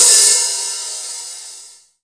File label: HH, Drum Dry; East Collexion; Drum 1 Dry